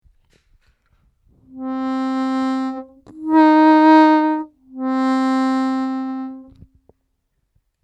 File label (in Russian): +1+1o+1, овербенд, губной гармошке